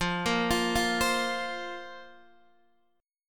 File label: F Suspended 4th F, Guitar DADGAD